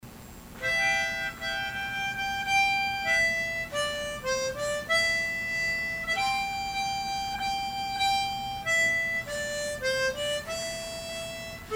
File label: Harmonica